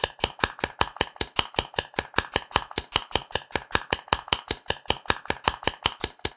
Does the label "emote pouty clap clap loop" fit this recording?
Yes